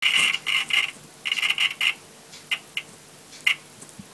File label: microwave